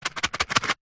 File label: household matches box shake